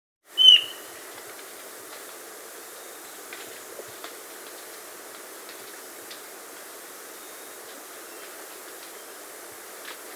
Ochre-Breasted Antpitta (Grallaricula Flavirostris), Andean Birds; S. America